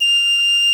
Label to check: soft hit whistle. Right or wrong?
Wrong